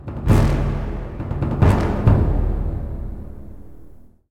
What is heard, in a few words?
stinger